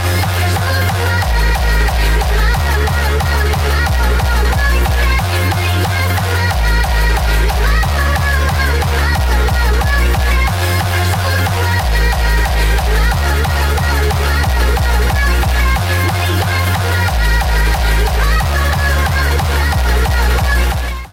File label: hardstyle